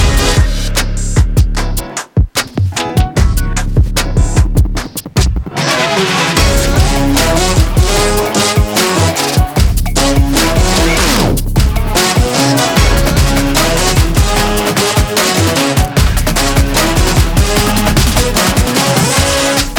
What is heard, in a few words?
Beat; UEFA; Mascot